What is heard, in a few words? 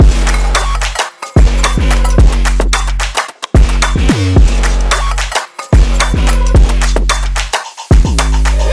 dynamic street pulse loop